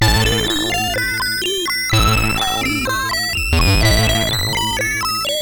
X-Music Remix, CSG8580

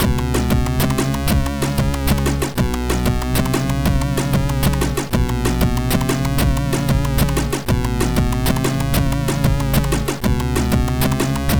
Save Jingle; sid; Imploder

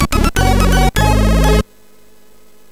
Ingame Jingle, MOS6581R4

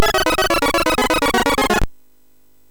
Sweep, MOS6581R3

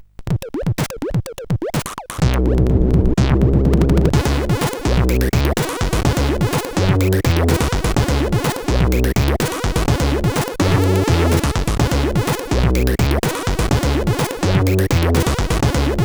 Ode in D, CSG8580R5

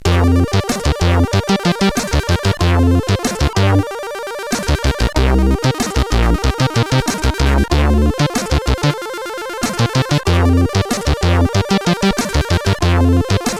sid, CSG8580; Dwarf Fly

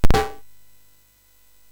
SFX, MOS6581; Narco Police; hvsc

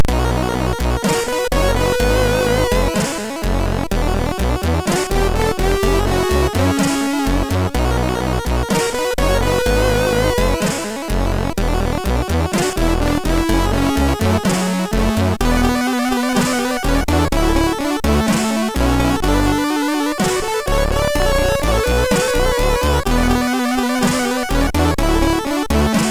MOS6581R3

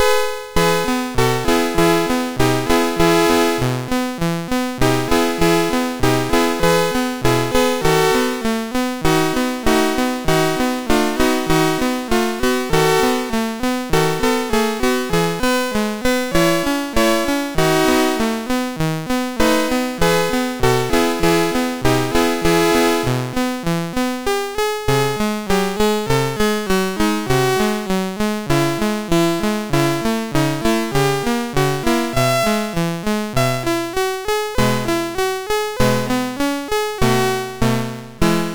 Digi Hits, MOS6581R3

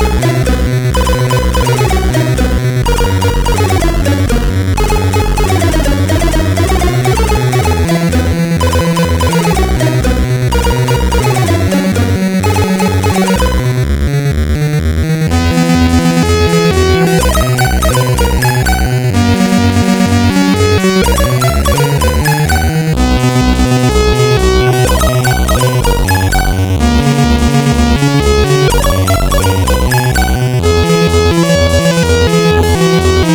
Riffsinus, sid CSG8580R5; hvsc

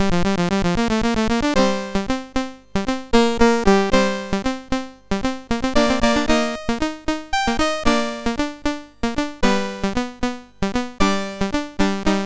Hillsfar, sid MOS6581R3

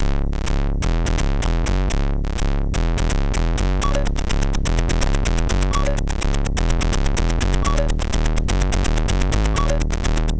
Beat, MOS 6581

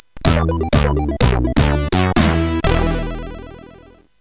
Two Jingles, CSG8580; hvsc

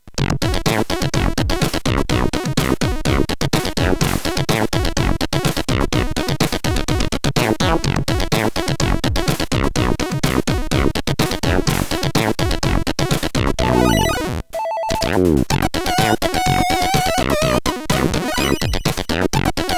Score, CSG8580

